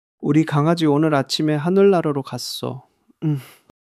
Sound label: crying